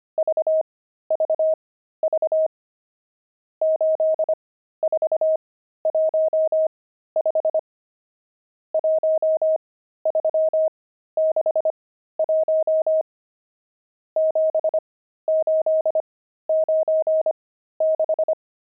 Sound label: pangram, intermediate pangrams files